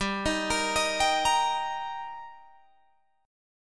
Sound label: G Minor Sharp 5th G Guitar Open G, chord